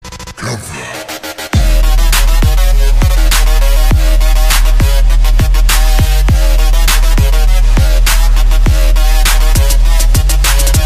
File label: Arabic Trap Remix